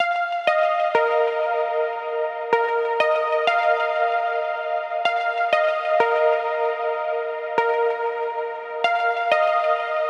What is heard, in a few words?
Mainroom Pluck